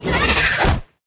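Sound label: riding lizard attack up; ezdovoy yasher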